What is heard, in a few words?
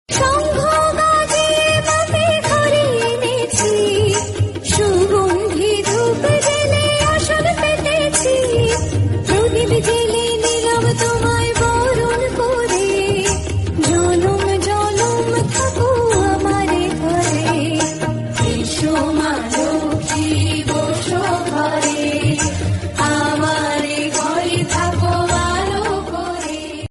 Bhakti Song